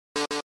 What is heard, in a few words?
first note